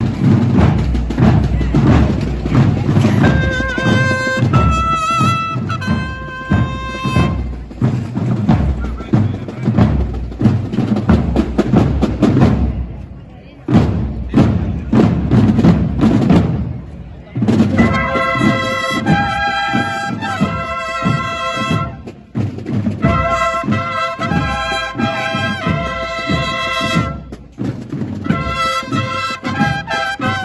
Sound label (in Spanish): Banda de Clarines y Tambores; Cristo Viejo; Santa María Magdalena